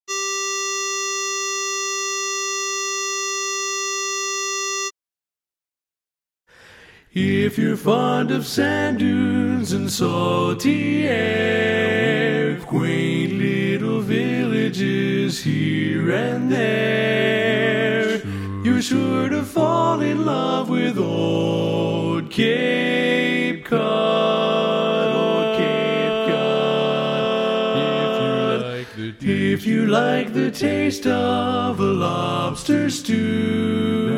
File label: Lead